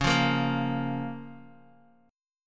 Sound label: Db 6th, Guitar, Open D